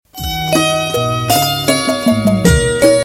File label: samsung sms ringtone